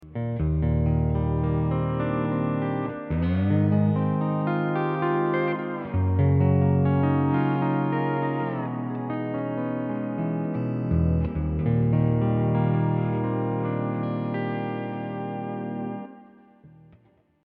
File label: hawaiian piece